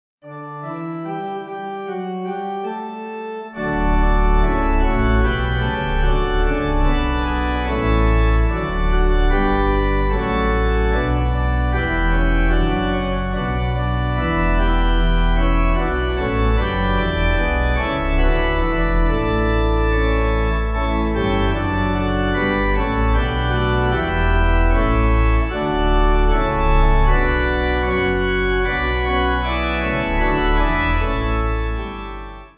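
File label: Gloria, pipe organ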